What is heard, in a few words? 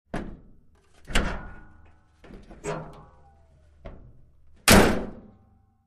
Wagoneer: Front Hood Open and Close, Jeep Wagoneer